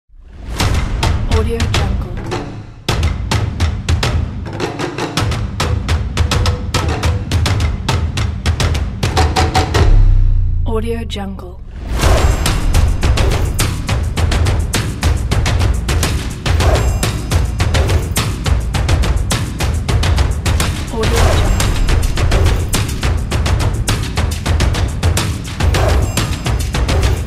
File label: tribal percussion